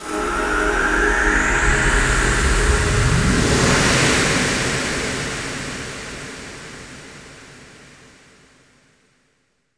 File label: Sweeps Long